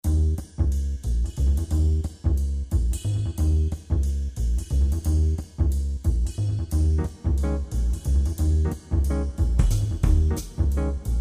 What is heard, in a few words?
Main Tune; PubQuizKnockout